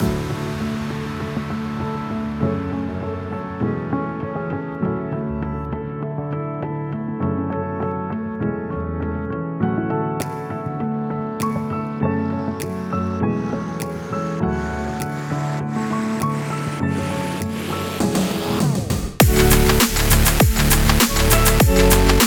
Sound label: Piano Colors avant garde piano instrument; Komplete